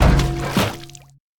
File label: Object Radioactive Barrel Break; Pulling No Punches